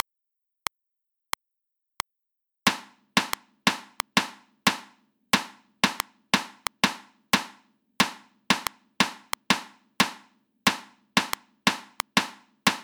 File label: rhythms 16ths at 90 bpm